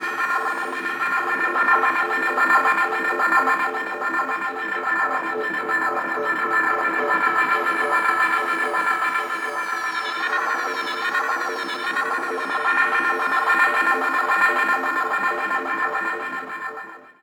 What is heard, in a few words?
falling loose, analogue; Zero-G Ambiosis